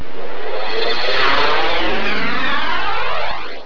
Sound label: jet; Star Trek